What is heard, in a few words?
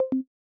Multiway Leave; iOS 26 Sounds